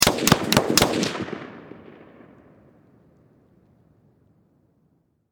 Warfare Machine Gun M16 Four Shots Distant Perspective